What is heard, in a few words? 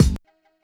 Kick, 085 Cmin